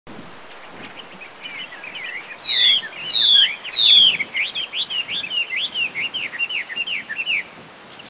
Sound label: Hwamei